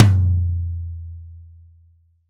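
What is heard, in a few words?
TY Low Tom, Roland BK-5 Toms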